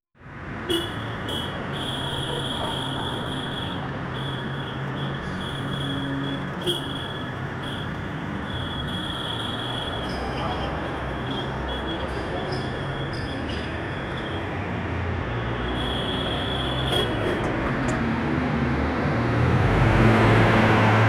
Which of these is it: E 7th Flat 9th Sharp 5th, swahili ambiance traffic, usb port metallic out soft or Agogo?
swahili ambiance traffic